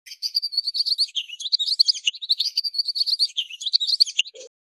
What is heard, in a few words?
distant bird calls, daytime